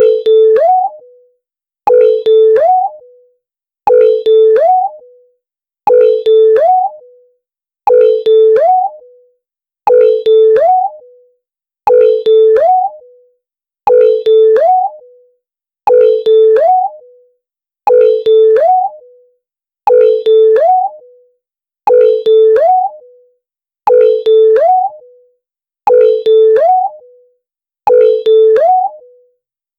funny exaggerated sound e, cartoon

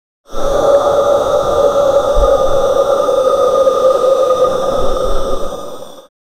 a heavy ragged multi, breathing

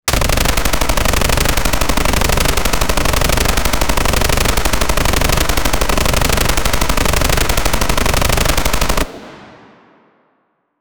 really punchy heavy machi, guns

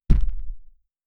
sound for a poisonous slime

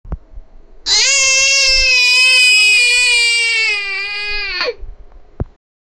child crying, infant

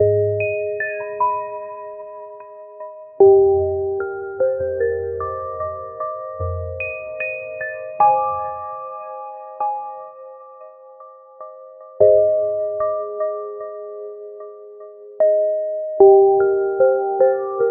loopable mystical ambient, 75 bpm

